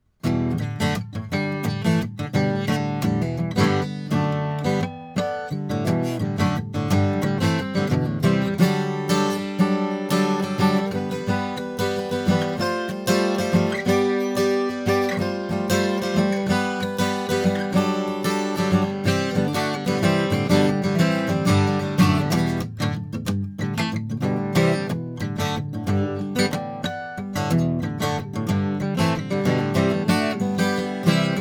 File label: fig8 Improv; AMI UM25Proto